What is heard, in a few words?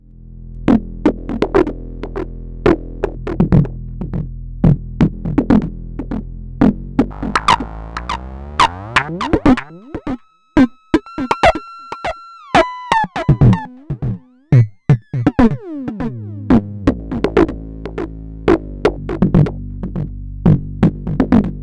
Ultra Kick VCO mod